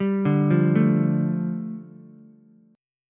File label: A 7th Suspended 4th Sharp 5th, A, down, Ukulele; A7sus4#5 arpeggiated